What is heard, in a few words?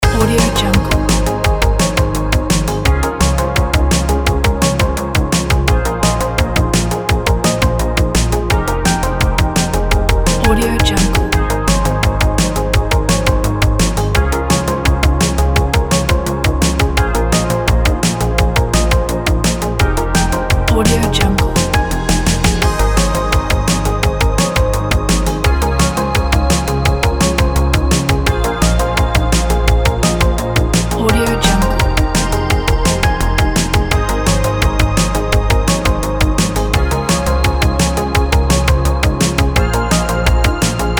melodic 80s pop